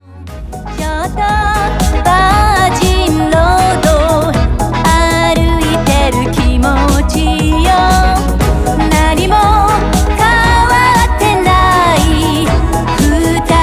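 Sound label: idol female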